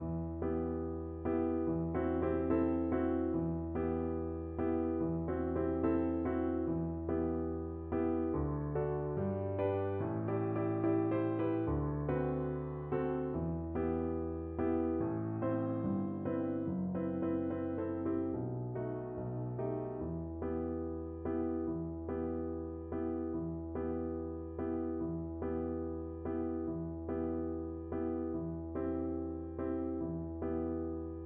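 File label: VLC karaoke, Cello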